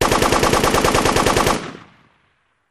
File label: Machine Gun Sngl Rap, single burst